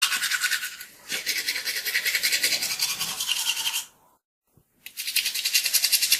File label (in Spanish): sonido cepillo dientes efecto de sonido